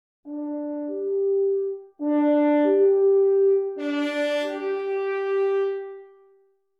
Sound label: horn velocities; expression